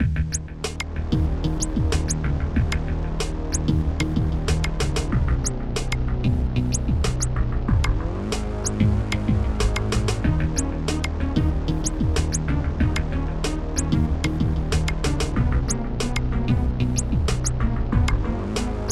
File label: placing computer; battleships